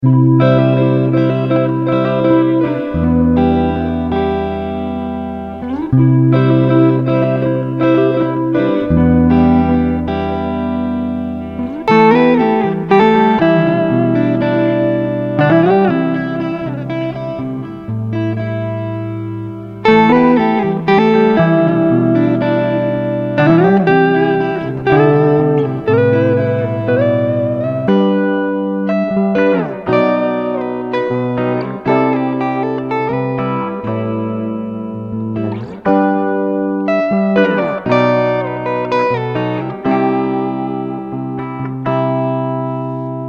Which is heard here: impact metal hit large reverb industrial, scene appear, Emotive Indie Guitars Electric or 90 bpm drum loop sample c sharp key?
Emotive Indie Guitars Electric